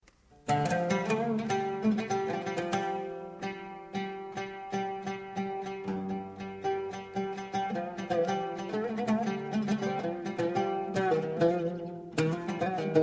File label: Oud Taksim